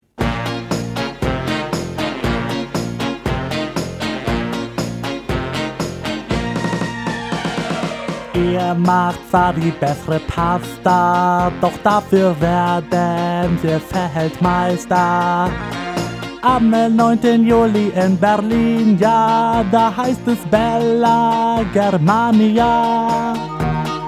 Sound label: song; deu ita; wm2006